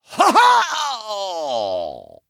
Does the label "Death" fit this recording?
Yes